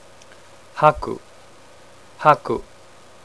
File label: sweep